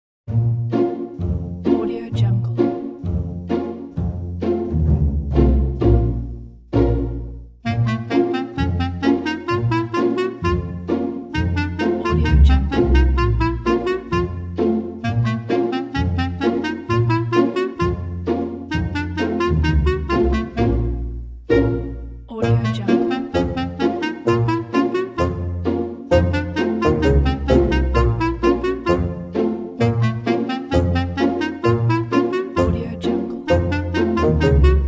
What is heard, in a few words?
comedy situation